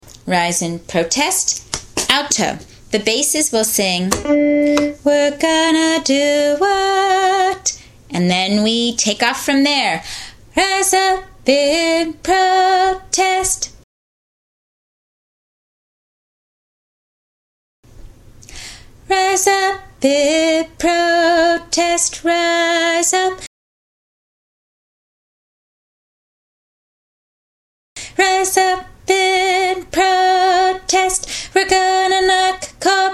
alto drill

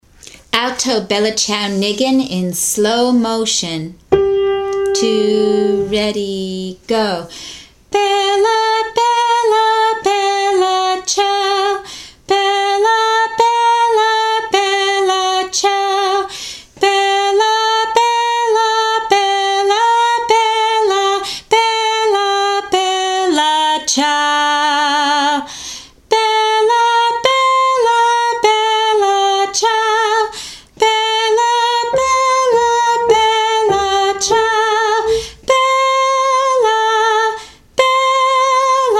Alto I Nign Slowly; Bella Ciao

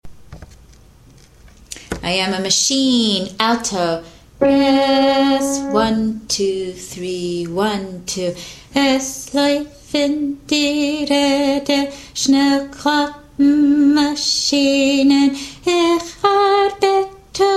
alto

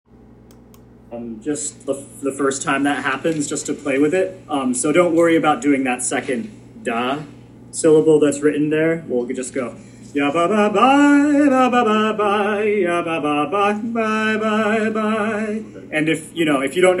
Using a krekht; sapozhkelekh

